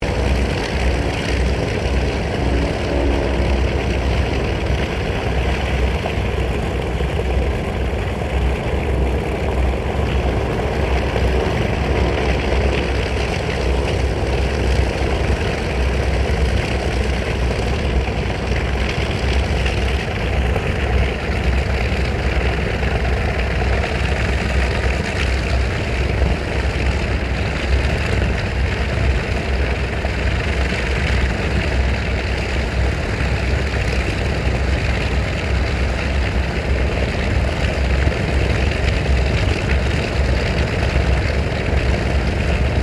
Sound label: AIRCRAFT LOCKHEED L-1049 SUPER CONSELLATION IDLE; 1955; Connie, Super Constellation; Airplanes (Sound Effects:Vehicles)